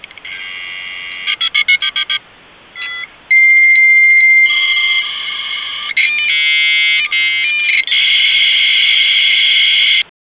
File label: dial